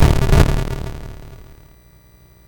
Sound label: BASIC sid MOS6581R4, GAMES; Sword of Fargoal